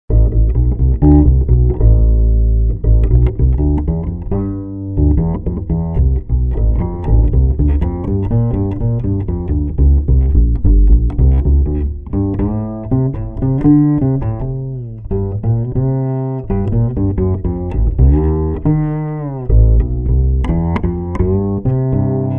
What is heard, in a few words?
Double Bass Warmup